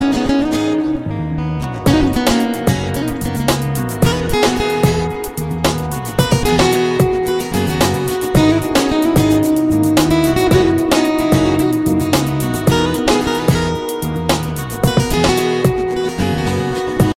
cool jannat guitar ringtone

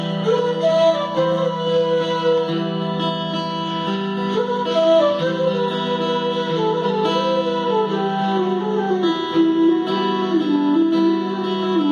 flute ringtone

